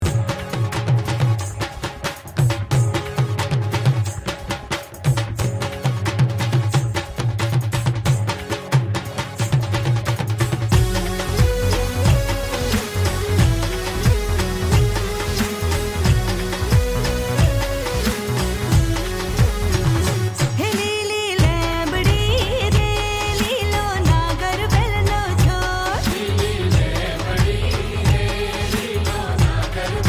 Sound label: New Gujarati Song